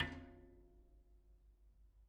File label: Bicycle Spoke